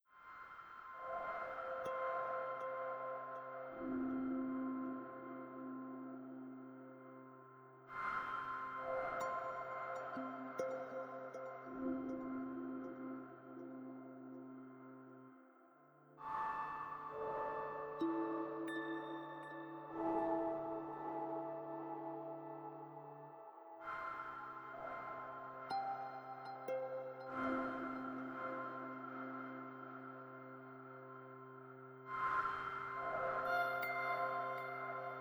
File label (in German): Entspannungsmusik, Breath; Diese wunderbare Entspannungsmusik bringt Dich in knapp 5 Minuten „von der Palme“.